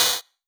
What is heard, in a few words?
Snare, 8-Bit; 8-Bit Kit Volume1